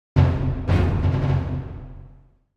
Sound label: Symphonic Hit Symphonic Drum And Strings Finale - Drum First And Last; Hollywood Edge; sound designer's toolkit v2